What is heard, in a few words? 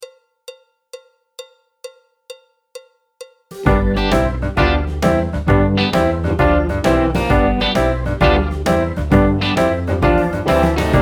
BT (piano) Extended; Jazz Syllabus Level 1